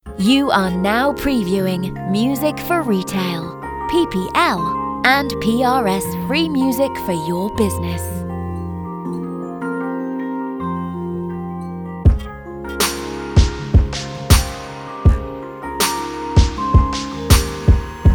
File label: hiphop